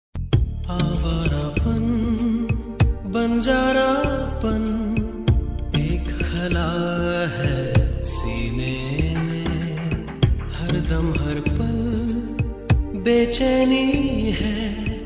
hindi ringtone

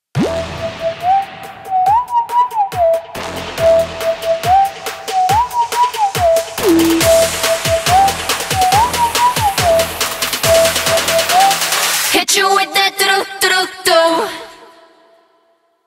BGM ringtone

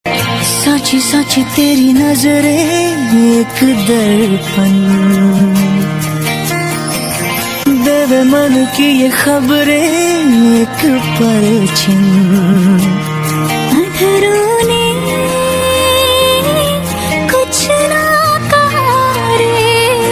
hindi ringtone

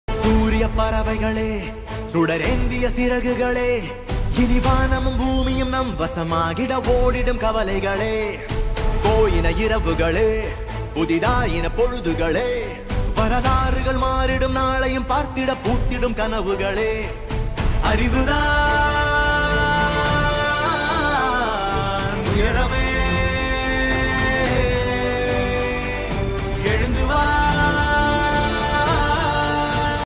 tamil ringtone

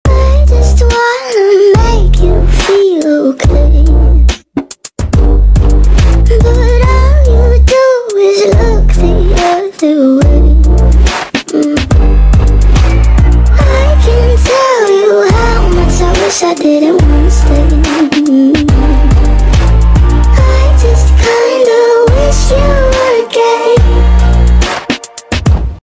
speedup ringtone

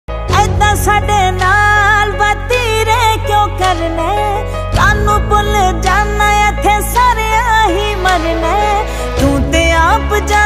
punjabi ringtone

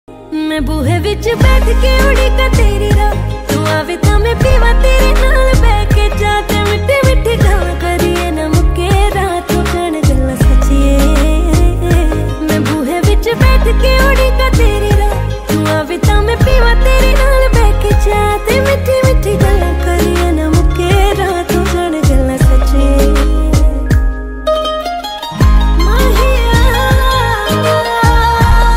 panjabi ringtone

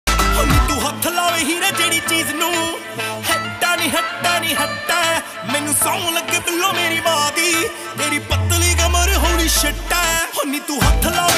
punjabi ringtone

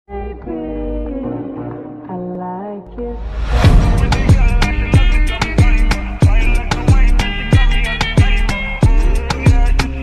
slowed ringtone

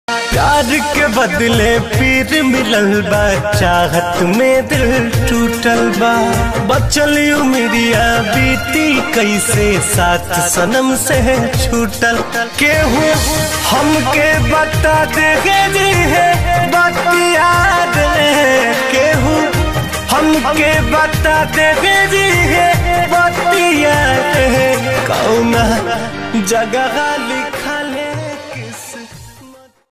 bhojpuri ringtone

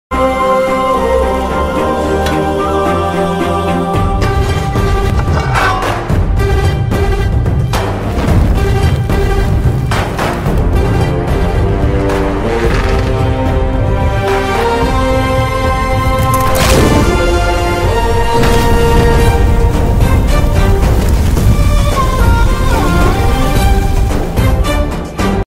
BGM music, tamil ringtone; Bahubali

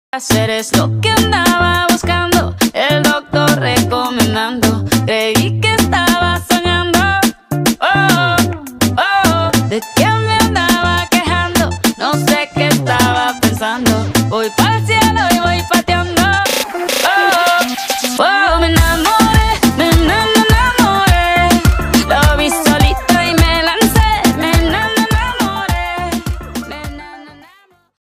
english ringtone

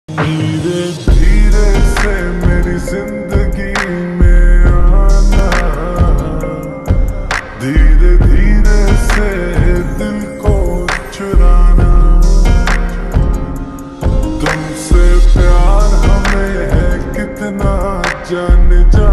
lofi hindi ringtone

